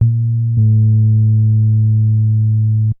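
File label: synth bass, drum and bass, 160 bpm, C; eJay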